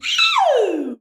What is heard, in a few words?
"iiiiiiuuu", shouts; Best Service; Hyper Dance